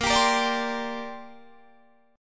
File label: Bb 6th Add 9th Bb Guitar Open D